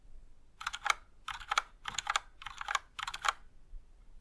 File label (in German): PBT Keycaps